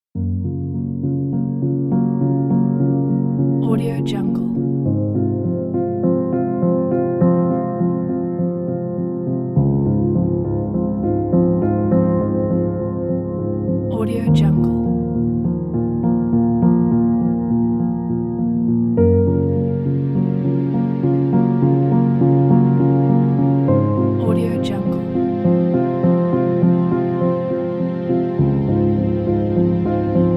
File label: rain and sorrow; dpmusicstudio